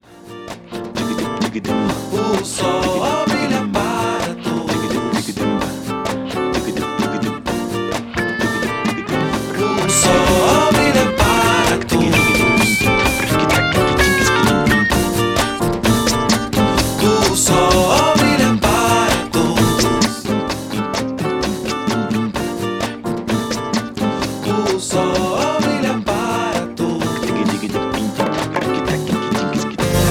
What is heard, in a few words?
Mambo Brasileiro; Unmastered Mastered